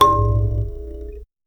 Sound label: kalimba mbira thumb piano; musical instrument